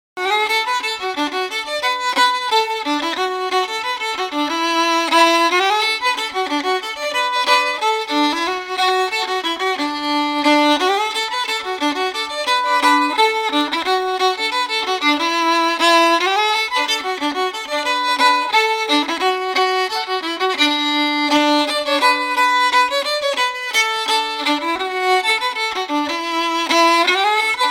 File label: fiddle; tune tutor